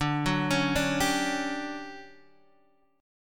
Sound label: D Major 11th D down, chord; arpeggiated; JGuitar